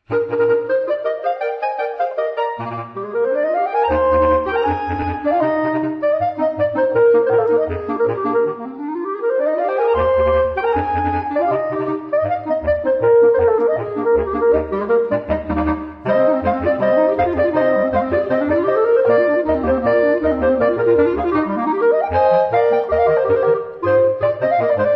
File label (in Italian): x 4 cl